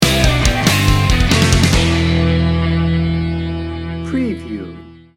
macho rock sting bumper

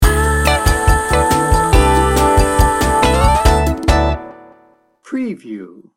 lite pop jazz sting bumper

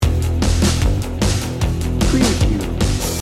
sunshine surfer loop

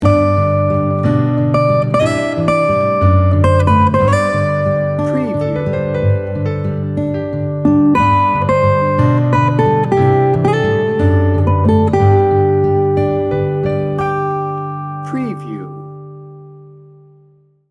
warm and tender

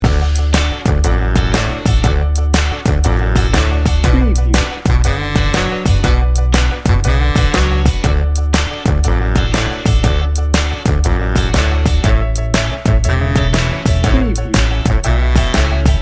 upbeat cheerful 50s pop loop; Bouncy and bright. Stand-up bass anchors this quirky combination

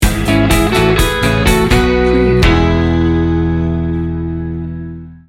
warm upbeat soft rock sting bumper

